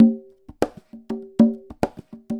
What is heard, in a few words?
Conga Loop (100BPM); musicradar